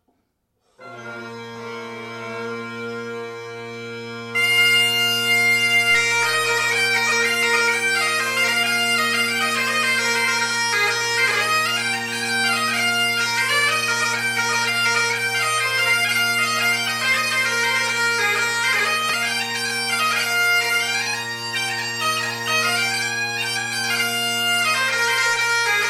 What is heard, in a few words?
Calliope; Piping Duo